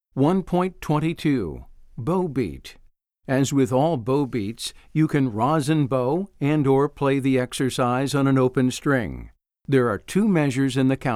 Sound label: Bow Beat